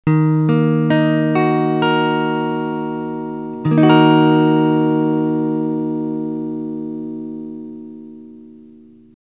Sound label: e flat minor, guitar